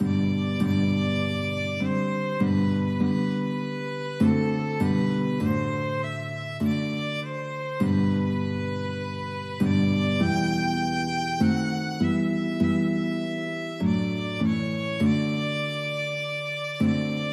violin guitar; it is well

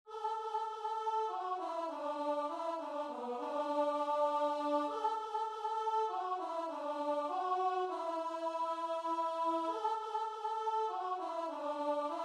sing hosanna, guitar and vocal

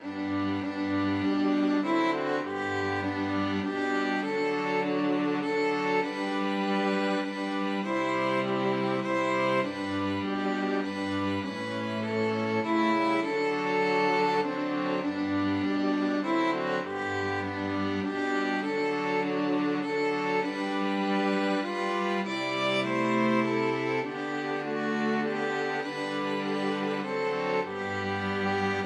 string quartet

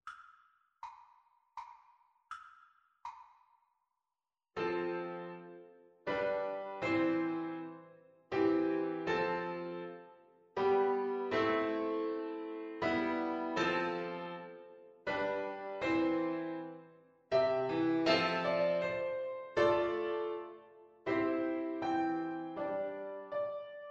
Tin Whistle

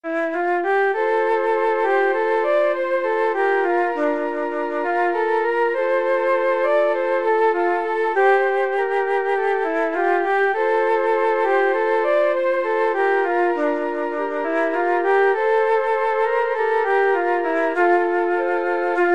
2 flutes clarinet

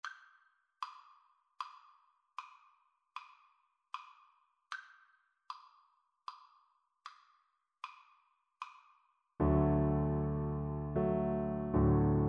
silent night violin

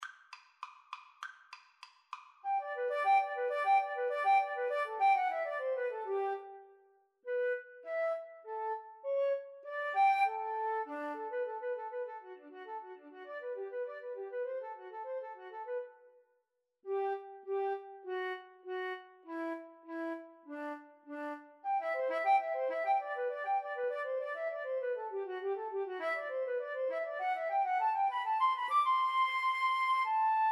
Violin Flute Duet

